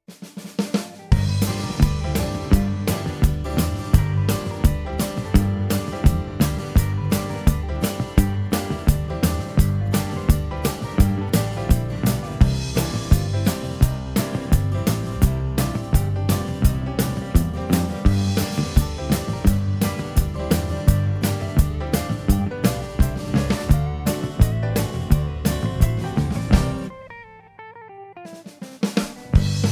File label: country, play alongs; Drum Lessons